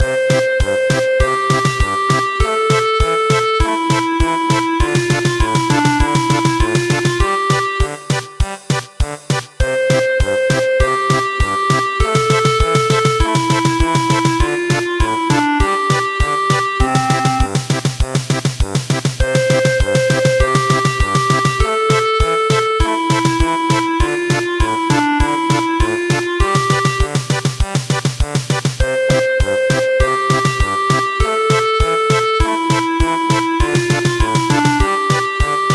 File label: German Polka; Bunny